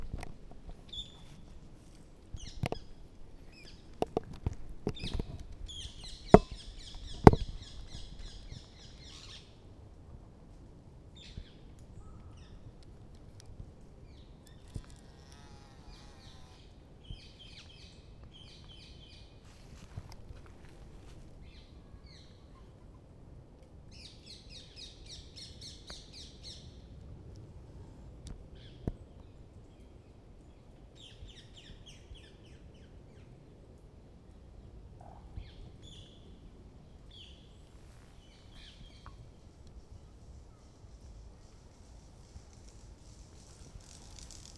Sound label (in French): Poudrerie oiseaux